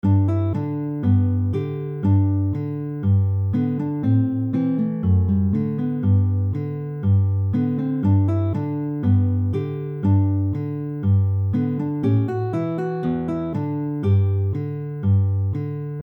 Chorus Fast, fingerpicking

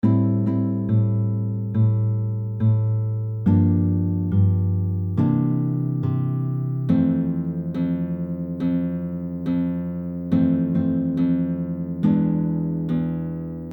Slow, fingerpicking

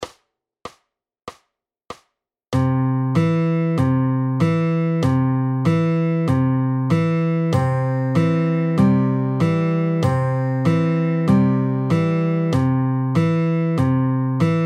Slow, fingerpicking

